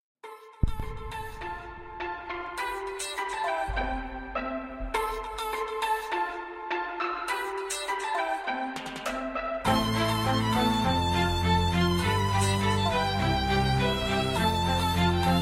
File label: Sax